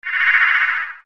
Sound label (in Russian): ptichij svist vdaleke